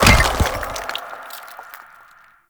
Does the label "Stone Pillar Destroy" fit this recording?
Yes